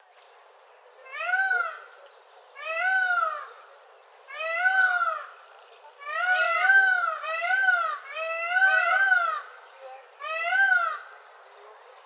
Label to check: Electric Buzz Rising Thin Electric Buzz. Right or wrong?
Wrong